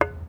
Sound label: Bust of Vicente Rocafuerte, percussion; Hermann Park; HOUSTON